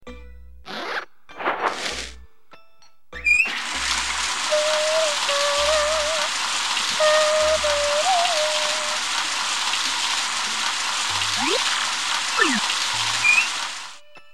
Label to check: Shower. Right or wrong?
Right